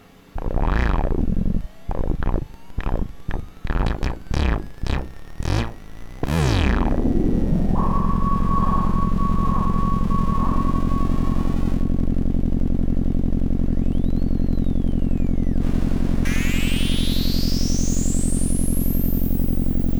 growling washboard; songs of insects

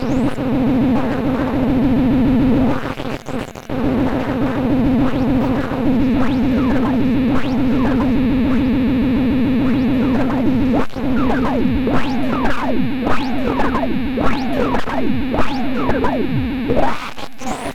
malevolence, synth, songs of insects

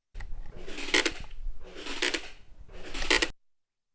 clicky

